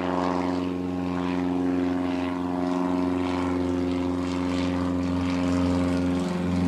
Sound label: plane